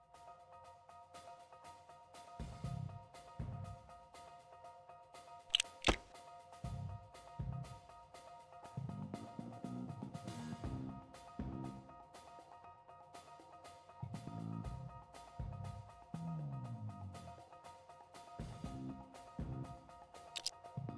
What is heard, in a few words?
Newsreport Outro; lego the incredibles 2018 pc; Cutscenes - Hubwaterfront